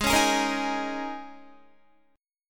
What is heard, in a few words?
G sharp Suspended 2nd Suspended 4th, G sharp, down, Guitar; strummed